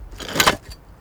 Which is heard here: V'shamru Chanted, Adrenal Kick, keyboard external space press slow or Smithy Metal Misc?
Smithy Metal Misc